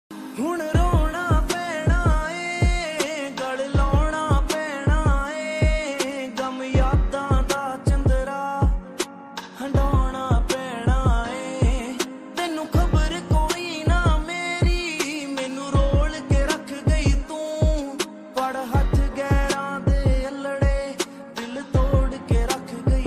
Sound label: punjabi ringtone